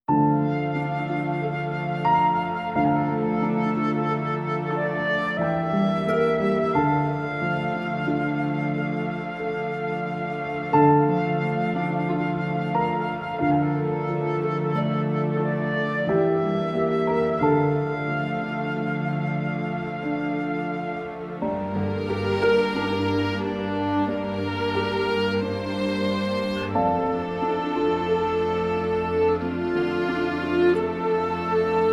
Flute Version; Aile; Masumlar Apartmanı Season 1 (2021)

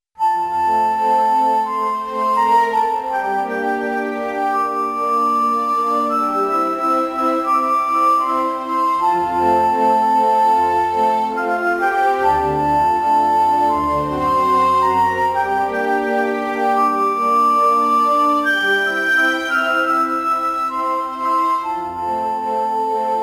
Piano; Unutulmaz 2010

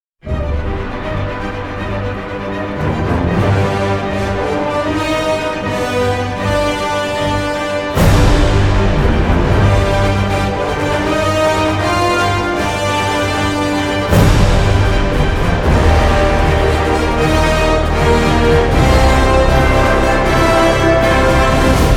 Fanfare; Marvel Studios; Thor The Dark World

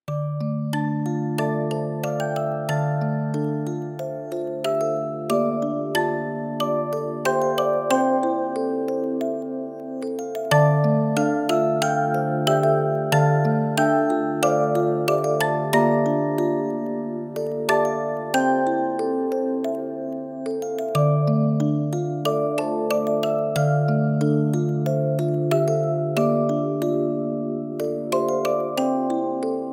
Lune (Music Box); Clair Obscur Expedition 33 (2025)

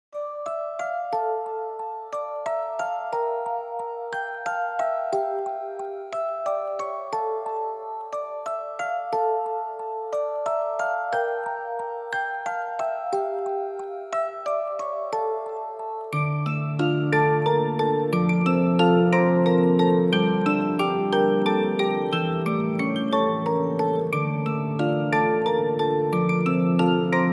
Verso (Music Box); Clair Obscur Expedition 33 (2025)

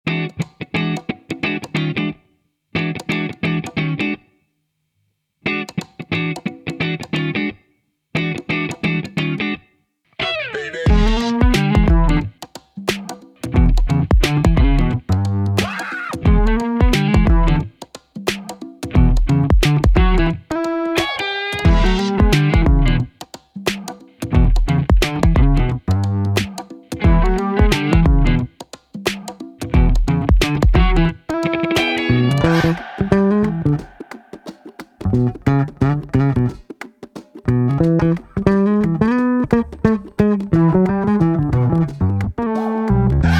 Funk; Wind Breaker (2024)

